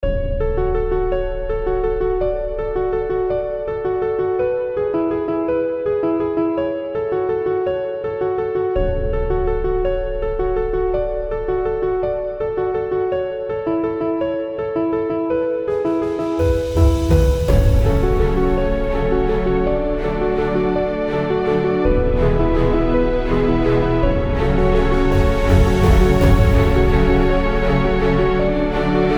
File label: Epic Inspiring Uplifting Emotional Drama